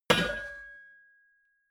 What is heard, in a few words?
hammer hit metal